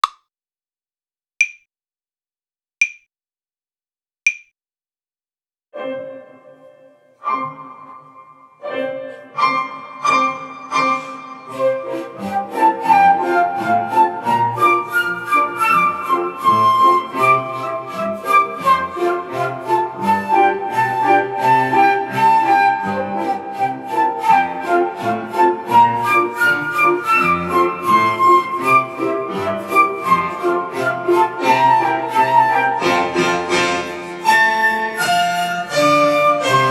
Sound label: Can Can Half Tempo